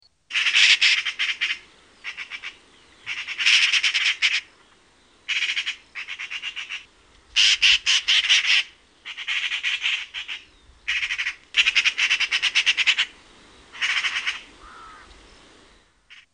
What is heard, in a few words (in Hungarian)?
Szarka; Madarak